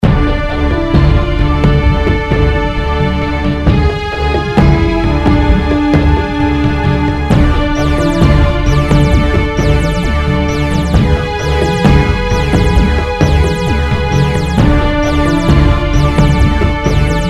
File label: music ingame; CAC ASSETS